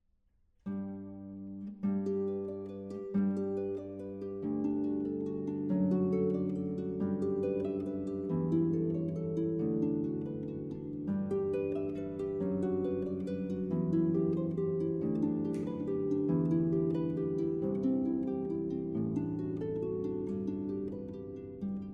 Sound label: harpeggio; Een vaste Burcht